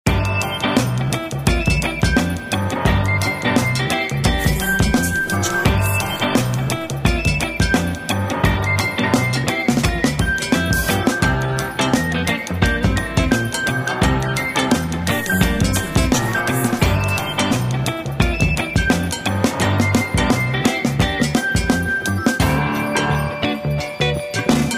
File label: Retro Funk